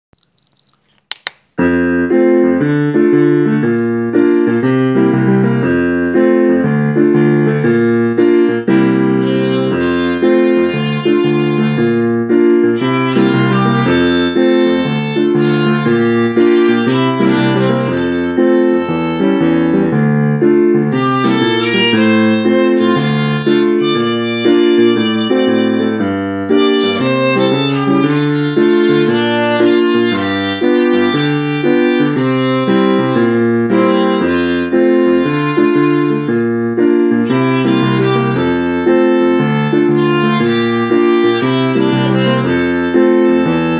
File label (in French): Violon